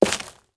Stone Walk

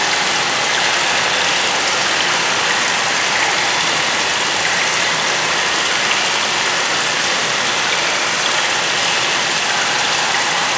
Link Water Amb Loop; moul-assets

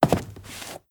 Soft Wood Turn

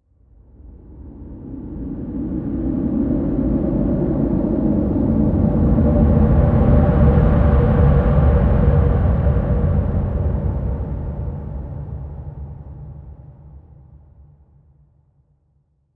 Rnd Abstract Amb

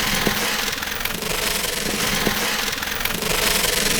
Cone Stn Slide Lp; moul

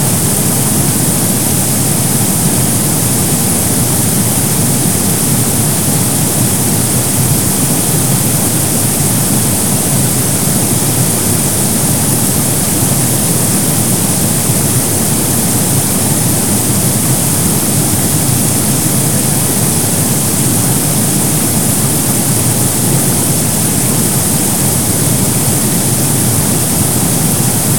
Waterfall; Kalamee

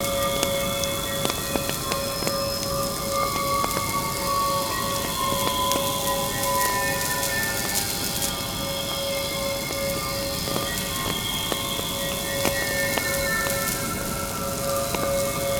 Sparky Loop

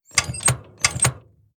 Lever Jam No Power; moul

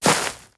Grass Walk; moul-assets

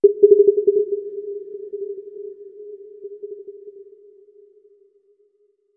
Forest Amb; moul

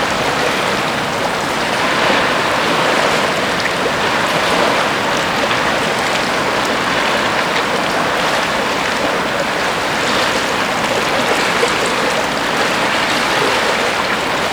Ocean Air Loop; moul

